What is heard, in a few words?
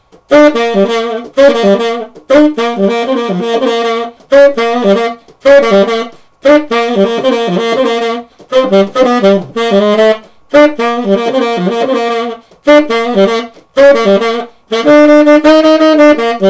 jazz